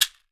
CAXAII, Definitive Percussion

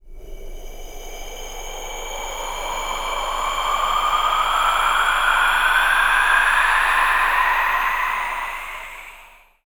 WIND COLD, VOCAL WIND; ILIO; Vocal Planet; Jazz & FX

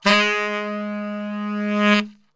G#1 SAX; Best Service; Brass Super Section

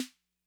Snare Min Tone Mid Snap, 808; classic machines

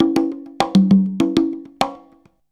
CONGA, Modern Percussion Loops

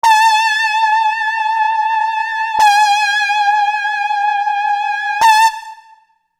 Keyboard

